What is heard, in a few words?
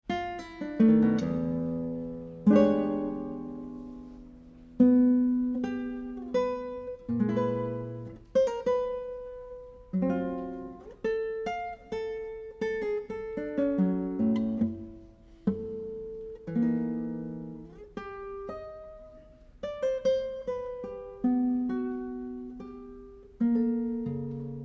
Tango